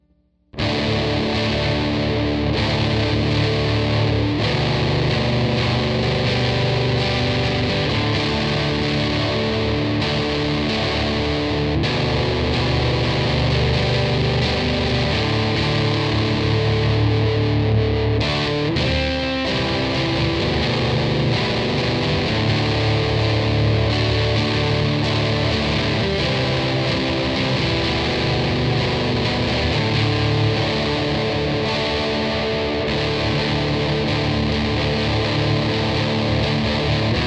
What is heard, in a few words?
electric